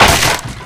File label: wood plank break; physics; source engine; left 4 dead 2